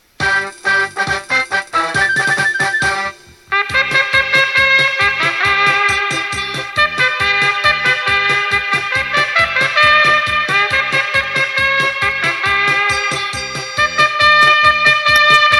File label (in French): trompette